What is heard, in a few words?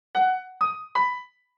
elevator chime